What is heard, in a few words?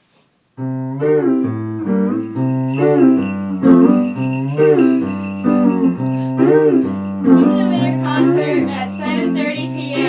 Jingles